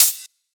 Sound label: Hihat, Psytrance; One Shots